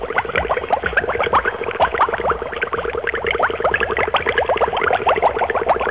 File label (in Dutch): Blub Blub; Kermis Jingles Diversen